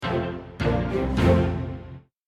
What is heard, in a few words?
level up; Dungeon Crawlers Metal